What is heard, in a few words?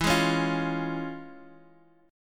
F Minor Major 7th Sharp 5th, E